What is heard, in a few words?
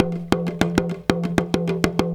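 small tama groove; Best Service; World Colours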